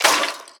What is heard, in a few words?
Skeleton Damage